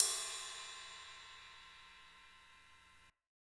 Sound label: DnB Drum Hit Ride; musicradar; Drum Hits A